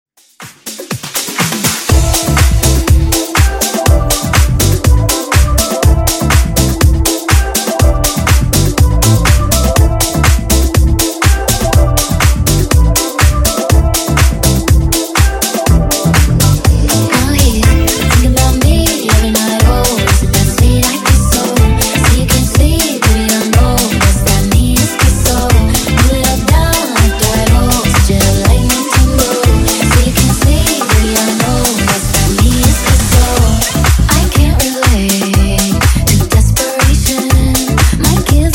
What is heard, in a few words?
Funk 122 Bpm